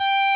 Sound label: solo